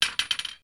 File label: fallover; QB64; InForm; DuckShoot